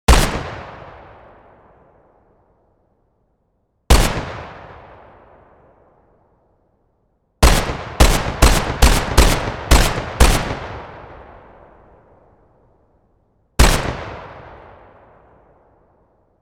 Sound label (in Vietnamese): Tieng sung ban don le 2 phat roi ban lien tiep